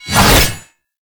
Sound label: Bayonet Melee Lunge; Warframe; Tenno